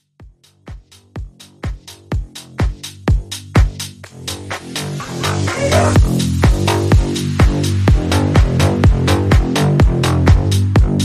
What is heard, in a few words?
Halloween Remix